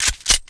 DEagle Slideback; cstrike

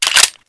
magin, deagle; cstrike

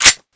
slideforward, deagle; cstrike

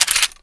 mag out, deagle; cstrike

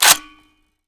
m4a1 boltrelease; cstrike

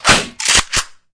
boltup; cstrike; AWM MONSTER

